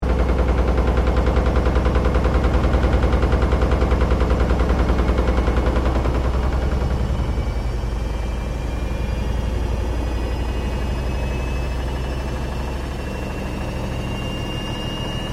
Washing Machine LG Frontloader Accelerate To Spin; Household Appliances; Laundry (Sound Effects:Household)

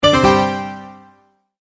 multimedia tone end; Tones; Sound Design; McKinney Sound

